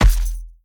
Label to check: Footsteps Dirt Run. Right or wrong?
Right